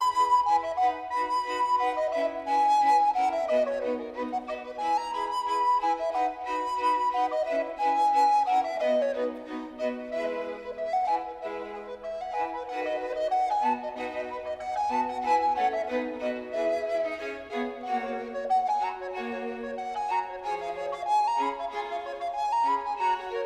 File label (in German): concerto c dur fuer altblockfloete streicher und basso continuo allegro